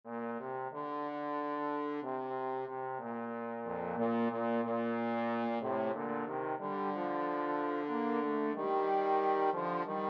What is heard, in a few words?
shenandoah trombone duet